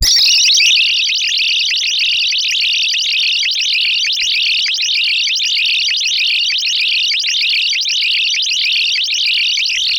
sci fi alien lasers

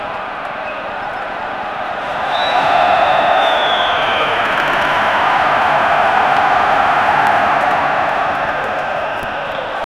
football stadium

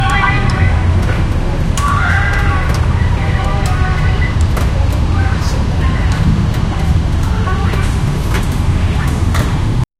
sounds of a 1950s chicago, neighborhood

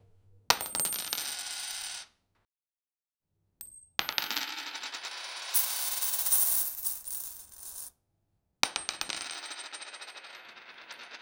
coins drop to floor; impact

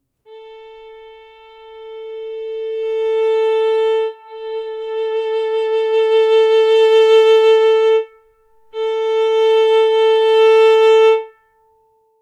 harpsichord concerto, classical

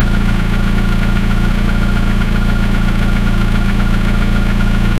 formula 1 car engine loop, motorsports

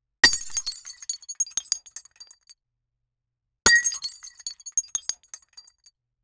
sound of a glass or, break

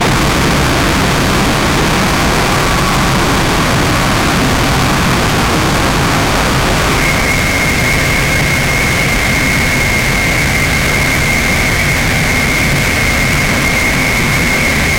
agressive engine

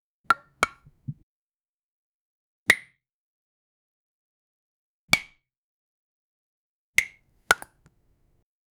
a soft moist plop of, domestic

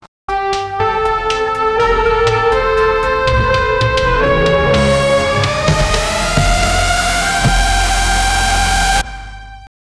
thriller; suspense

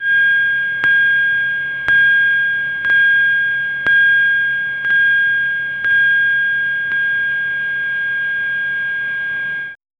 error beep flat tone, negative feedback

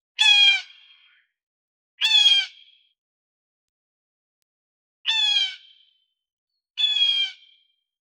eagle hawk taking damage; bird

